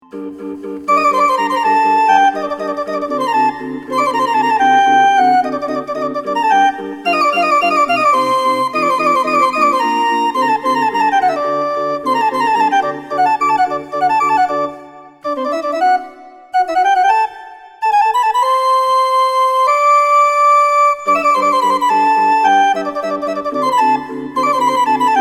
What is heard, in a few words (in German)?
konzertorgel, drehorgel; 31er; die diebische elster